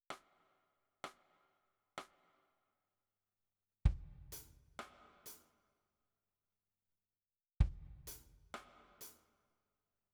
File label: Drums